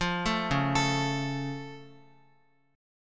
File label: Db Augmented Db down Guitar; arpeggiated; JGuitar